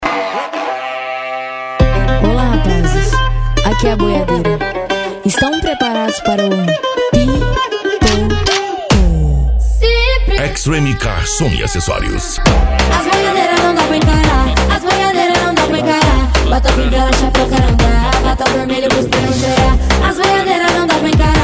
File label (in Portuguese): piseiro e sertanejo